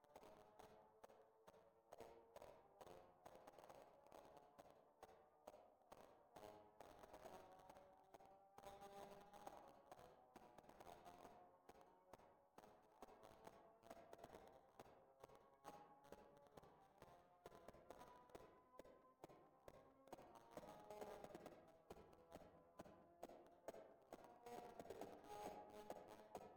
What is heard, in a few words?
Ambient Bar Broken BGM; Drifting Lands